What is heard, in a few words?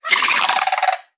Swamp Jumpers Investigate; we are the dwarves gamerip 2016